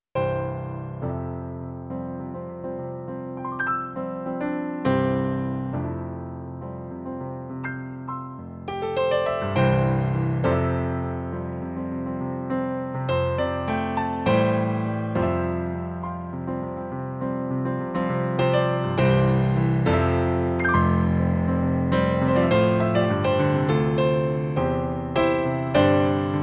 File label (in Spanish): Grabacion del piano electronico yamaha p 45